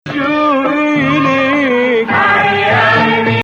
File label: Nawa Bayati